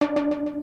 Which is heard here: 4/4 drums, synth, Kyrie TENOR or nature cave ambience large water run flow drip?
synth